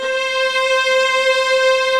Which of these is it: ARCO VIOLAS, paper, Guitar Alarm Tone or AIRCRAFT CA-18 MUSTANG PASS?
ARCO VIOLAS